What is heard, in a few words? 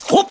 Grunt; Farnsworth; futurama worlds of tomorrow